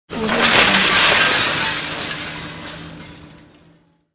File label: demolition collapse